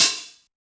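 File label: Hi-Hat Low Open; Yamaha DJX-2B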